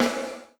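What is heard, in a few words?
gated snare drum, Drums Acoustic; USB Soundscan vol.10; AKAI; 90s sample CD; GATEKIT 1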